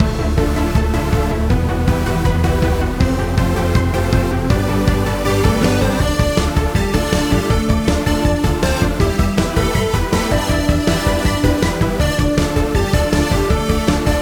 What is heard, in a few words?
pure raceway bpm160, Music for racing game.